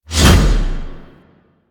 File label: Proj Throw; Army; Warframe; Hoplite